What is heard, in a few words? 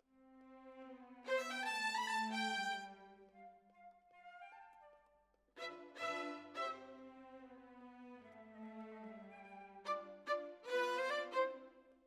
Violins, jazz for piano